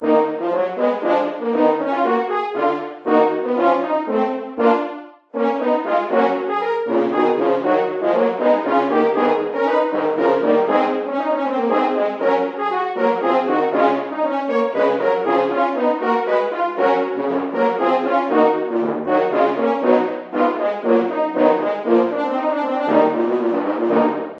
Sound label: Horn; Wayland's Watch